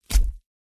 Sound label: flesh chop